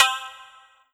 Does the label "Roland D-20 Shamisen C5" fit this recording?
Yes